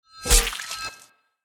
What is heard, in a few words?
Sword Slice Flesh; Tyrael